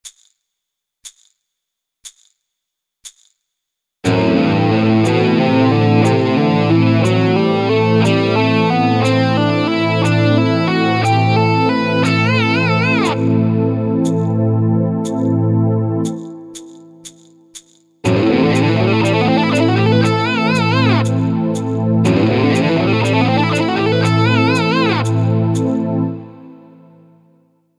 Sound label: Metal; Metal Bootcamp